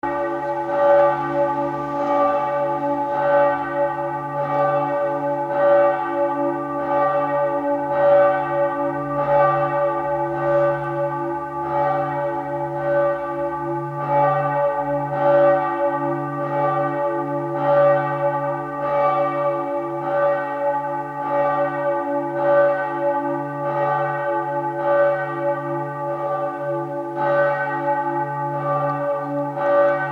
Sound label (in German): Glocke; dom st martin rottenburg